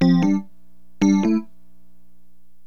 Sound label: rhodes, keyboards; HipHop; LiveAct Pool 1